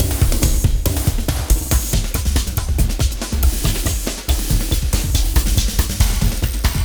Wild Ride 140

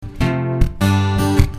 Punchy F,G

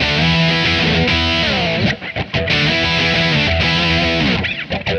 E4 95 chords

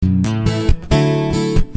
Punchy A,D

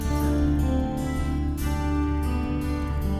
Picking G

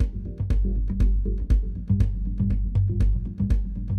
Mallimban Gm7 120

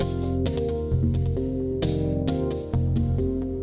Funky 125-132 Fm7 9

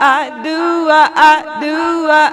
Vocal Echoes

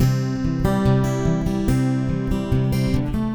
Picking G

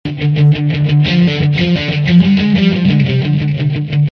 D 120 rhythm

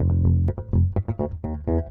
Bb 120 Funk Rock

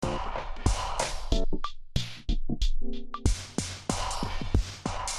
Funky Hopper F7 93

